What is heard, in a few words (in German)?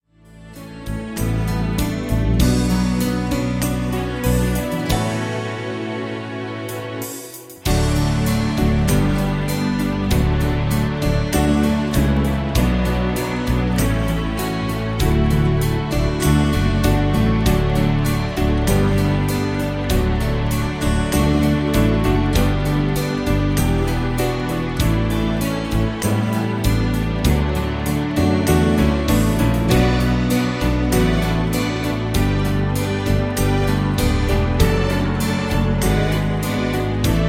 gott wird helfen playback